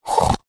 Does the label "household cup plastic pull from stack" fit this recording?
Yes